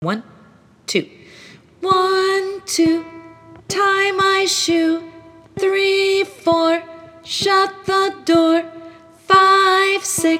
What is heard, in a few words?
The Counting Song